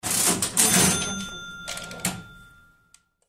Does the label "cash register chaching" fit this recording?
Yes